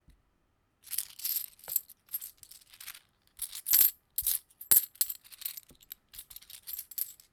coin; お金を触る音２

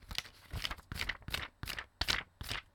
paper; メモ帳ペラペラめくる

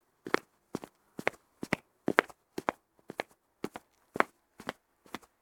step; 長靴で道路を歩く

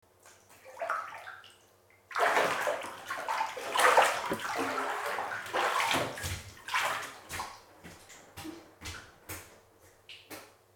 water; 浴槽から出る音

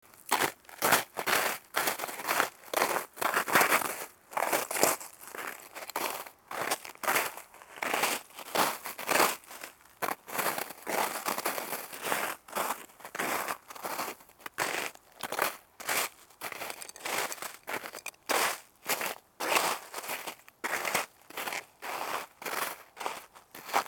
step; 少し固まった雪道を歩く

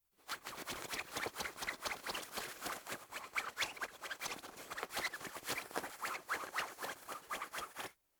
cloth; 布をすばやくこしょこしょ

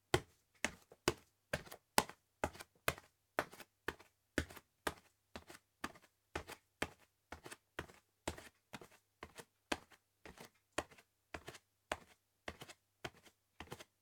step; はだしで歩く３

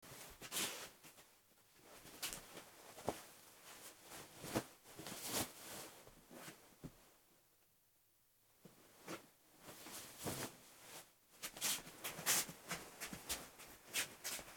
step; 着物で座ってから立ち上がり歩き出す

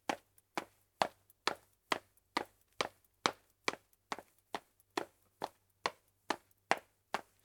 step; 固い床を歩く

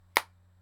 machine; 携帯閉じる２

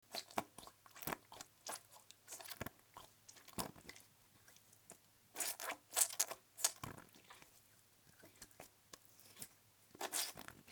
water; スライム的ぐじゅぐじゅ音など（水で塗らした手を握ったり叩いたり）

